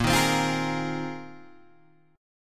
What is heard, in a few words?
Bb 7th Suspended 2nd Suspended 4th Bb down Guitar Open G